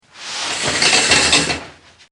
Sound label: Shower Curtain Drawn Open